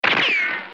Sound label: ricochet, weapons